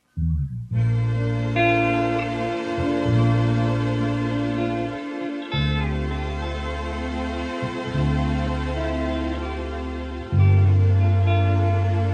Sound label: guitar chill; valentines day